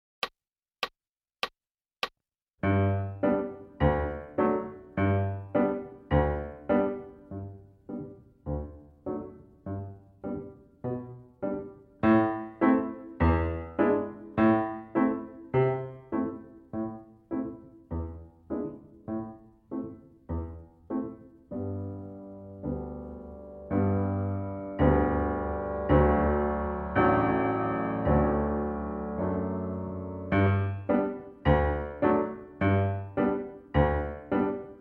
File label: Piano